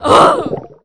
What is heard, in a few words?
Siren Wave Damage